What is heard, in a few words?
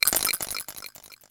RATTLES; BEAT2000